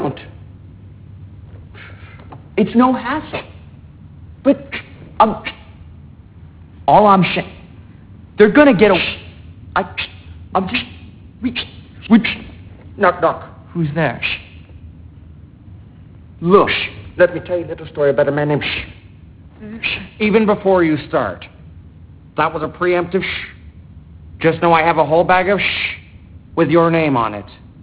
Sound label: shh